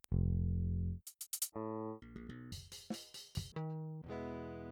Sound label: Saturator; Virtual Analog